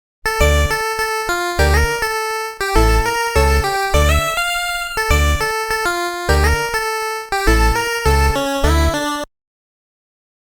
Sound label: 8-Bit